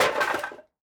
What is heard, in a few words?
wood sticks fall on concrete; matter mayhem; soundmorph